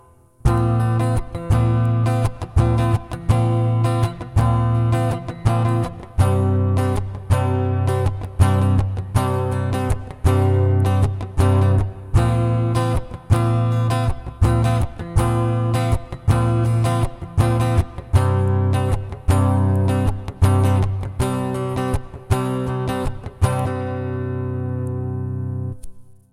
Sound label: acoustic; native instruments; guitar rig